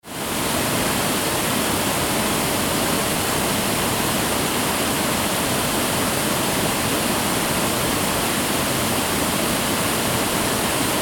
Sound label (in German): Wasserfall Rallarvegen; Radreise Skandinavien 08 2016